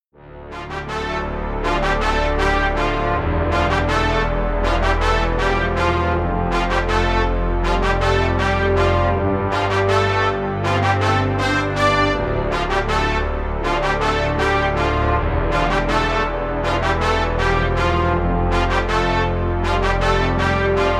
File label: Brass Balboa Melody; ambition brass melodies